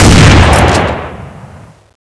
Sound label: xm2010 shoot